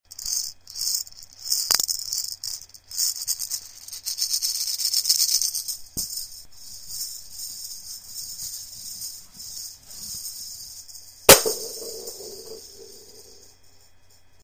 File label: rattle ball